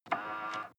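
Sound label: sports car interior side mirror adjust short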